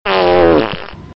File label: A Lazy Farting